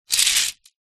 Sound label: tent pole folded pick up from concrete ground; foley